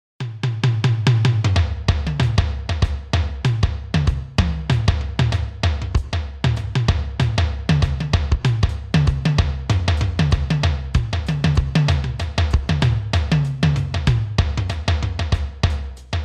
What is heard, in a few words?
TOMTOM